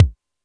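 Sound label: kick Elec, Alesis D4; 300 Drum Machines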